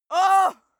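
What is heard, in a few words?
female pain, screams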